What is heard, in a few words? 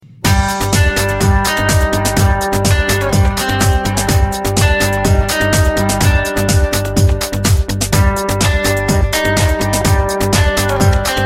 Guitar Remix